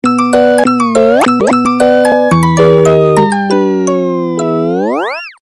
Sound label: nokia battery down mix